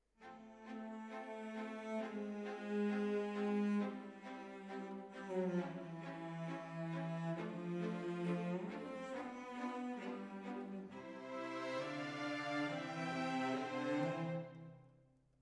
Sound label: Decca, Jazz for piano